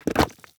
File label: object drop stone